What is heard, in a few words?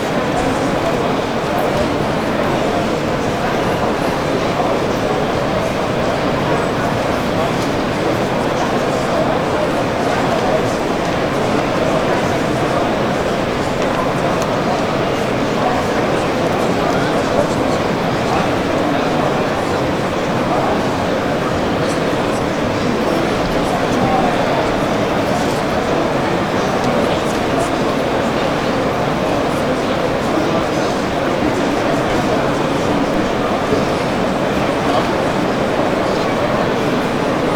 large crowd inside hall; best service Blue Box; crowds interior